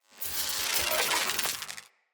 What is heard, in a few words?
Shock Mote Strike Charge Up